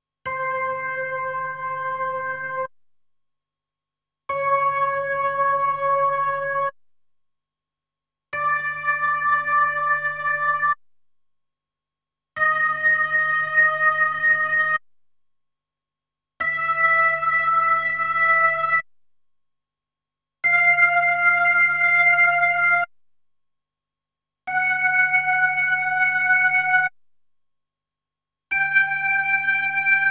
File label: B3 Organ; Masterbits CLIMAX 8